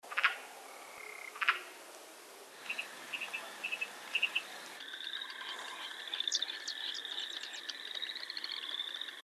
RAIL VIRGINIA

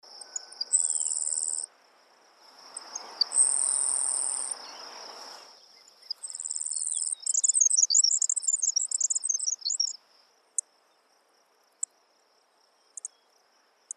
SPARROW GRASSHOPPER

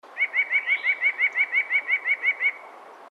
NUTHATCH WHITE BREASTED

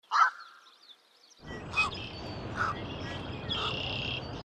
HERON BLACK CROWNED NIGHT

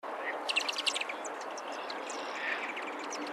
DOWITCHER SHORT BILLED

